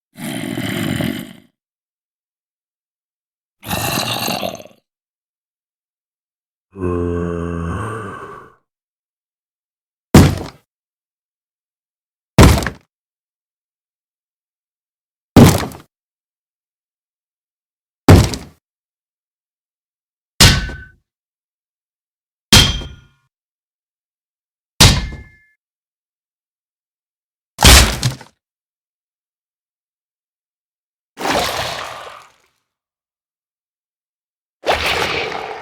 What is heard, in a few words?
Minecraft Zombie Sounds